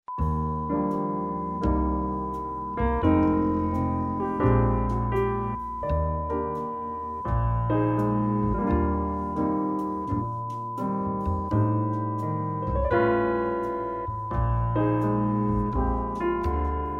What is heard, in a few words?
cheerful time